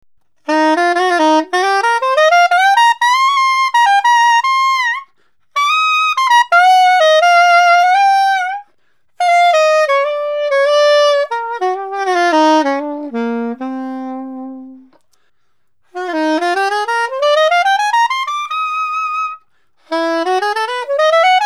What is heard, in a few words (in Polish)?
sopran, saksofon